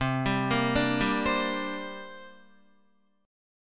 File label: C 7th Suspended 2nd, Guitar; C7sus2 arpeggiated